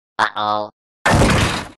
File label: droid death; lego star wars